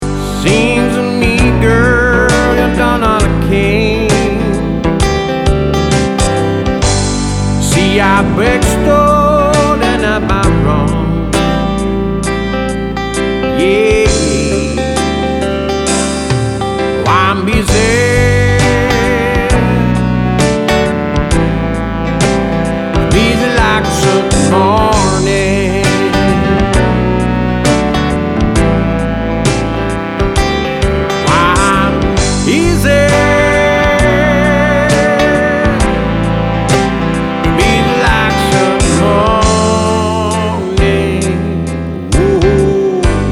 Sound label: ballad n blues